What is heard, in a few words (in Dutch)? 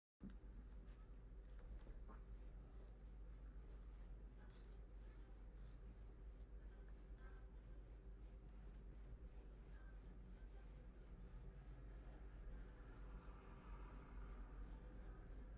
achtergrond stem tenor